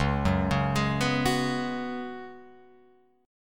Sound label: Db 7th Suspended 4th, Guitar, Open F, chord